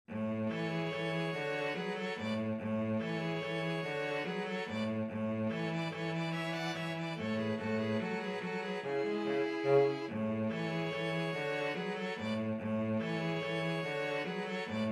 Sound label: string trio